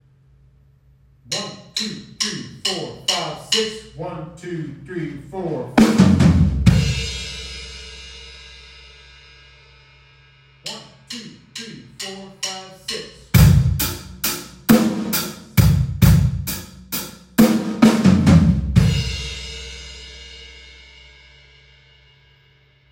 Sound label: 6 8 fills, drums